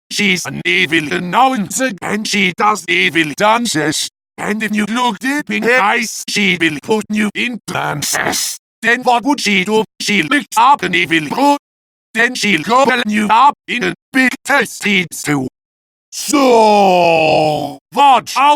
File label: Evil Announcer (Acapella)